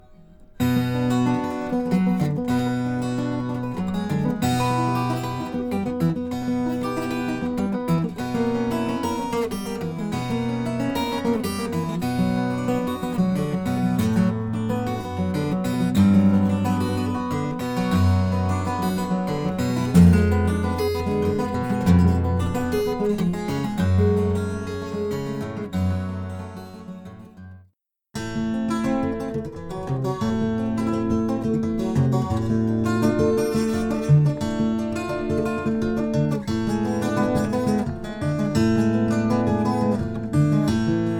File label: Dm sample; guitars